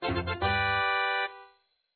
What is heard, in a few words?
Customer Overjoyed; papas cupcakeria online windows gamerip 2013